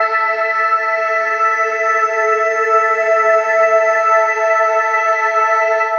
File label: Dream Pad 5th